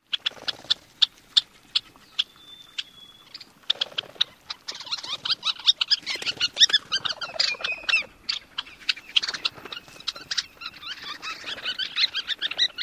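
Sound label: kett calls and interaction sounds; AK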